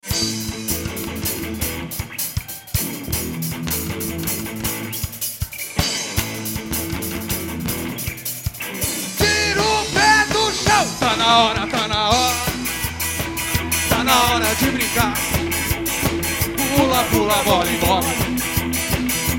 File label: medley